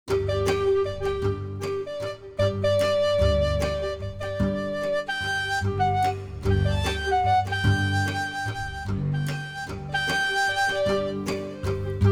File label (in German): Shakespeare Musik